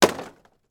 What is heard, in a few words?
impact wooden box thin fall on junk; sound effects one